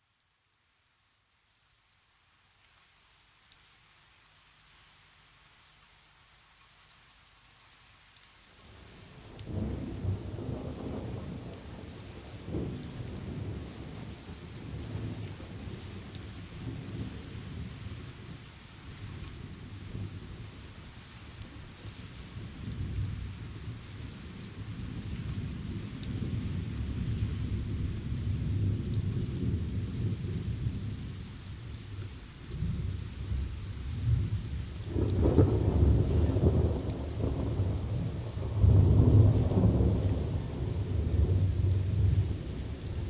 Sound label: Thunderstorm Out In The Fields